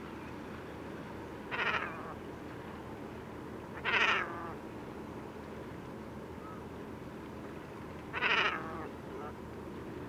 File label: Great Cormorant Phalacrocorax carbo; Ladakhi name:Nyaza